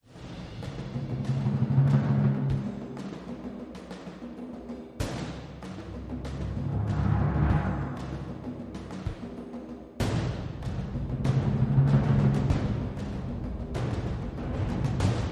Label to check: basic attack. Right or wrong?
Wrong